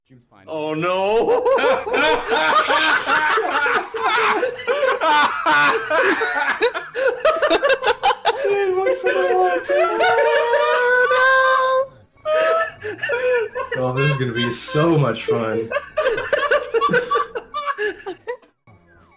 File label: laugh